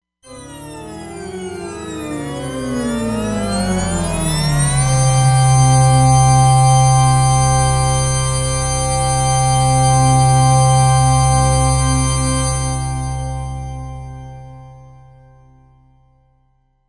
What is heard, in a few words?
Bending Logo; Roland Fantom XR